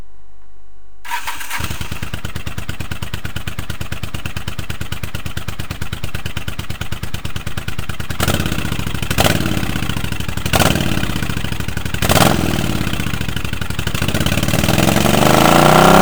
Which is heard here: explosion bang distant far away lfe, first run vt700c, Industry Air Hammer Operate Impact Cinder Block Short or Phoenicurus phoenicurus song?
first run vt700c